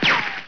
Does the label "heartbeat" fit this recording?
No